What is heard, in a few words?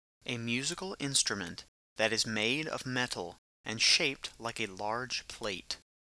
cymbal